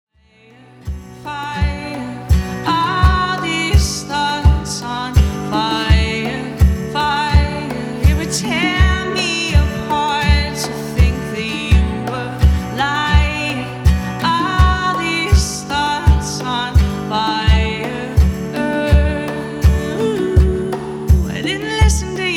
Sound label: slow grooves